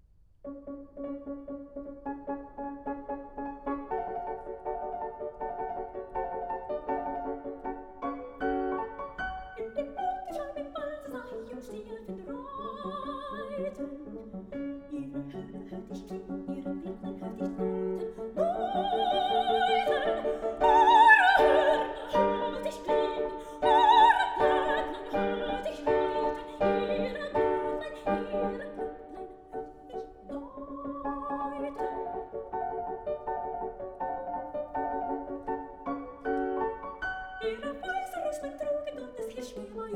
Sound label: song for voice & piano; Neue Liebe ("In dem Mondenschein")